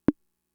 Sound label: Conga, Fricke MFB-522